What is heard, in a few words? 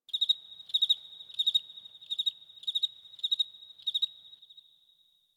Insect Night; Warframe; Ambience; Eidolon Plains; One Offs